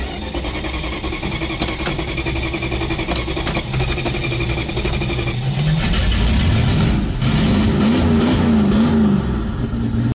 chrysler startup

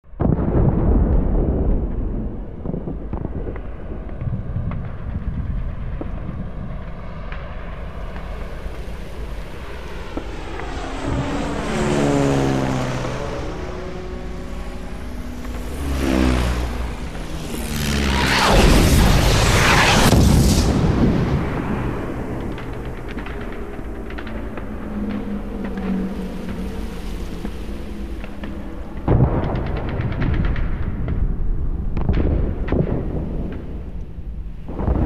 Amb Town Ext

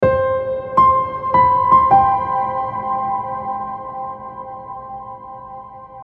DreamGrand

